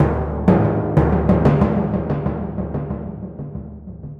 Timp G sharp

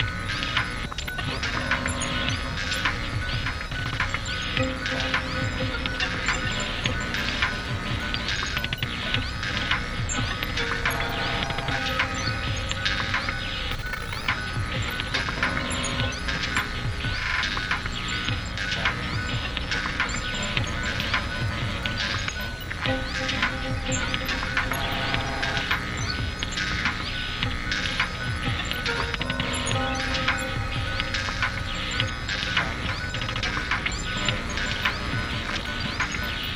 Ingamemenu Gameover; rive wreck hack die retry; ps4

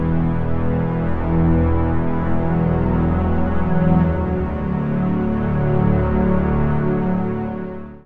Intro Game Logo; Strategic War in Europe

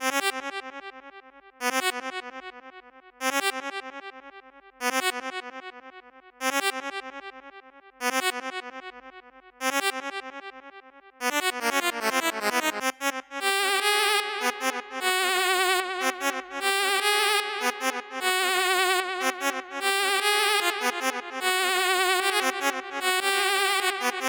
Melody; chippy

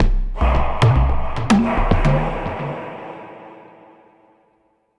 basicMood Cueva Bosque

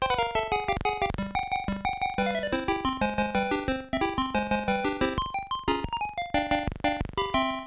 Game Over

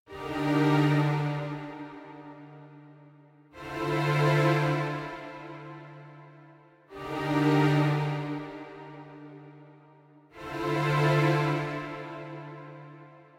Story End; Sword of Convallaria; Android

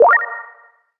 Item Card Chosen Positive Beep

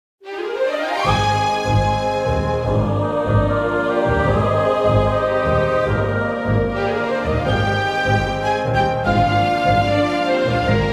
Main Menu 150BPM; ps4; xbox series xs; SM2 Aventure

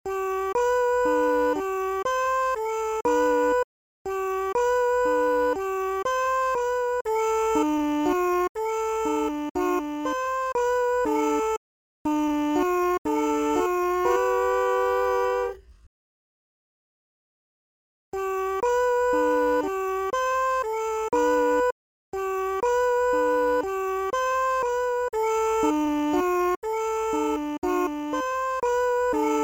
Vox; Gremlins Gizmo; wii

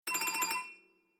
Love Flutter; F.A.M.P.S

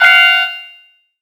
Object Train; BOMBERGIRL RAINBOW; Arcade

